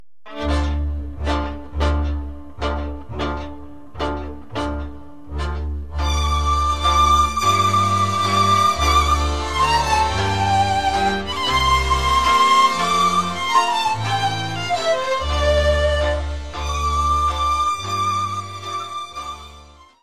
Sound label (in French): Melodi Oltenia, Roumanie